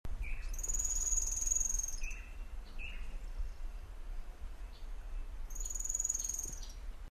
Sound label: Picumnus cirratus